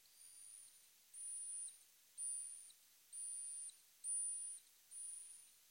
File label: R. hipposideros, bats